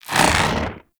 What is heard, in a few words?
Head Pull; Warframe; Teralyst; Ropalolyst; Flight